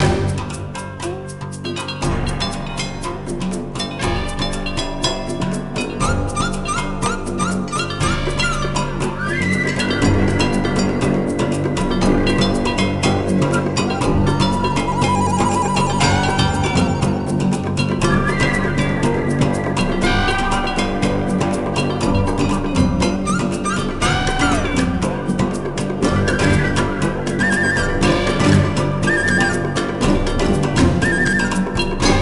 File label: BGM Level Bamboo Forest Combat; Kung Fu Panda 2; nds